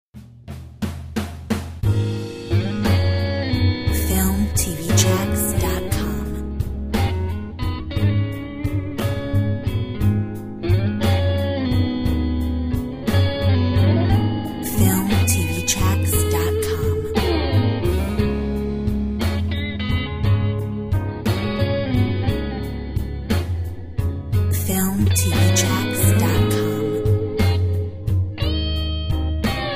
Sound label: Blues